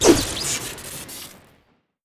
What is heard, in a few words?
boxing gloves swing crit; tf2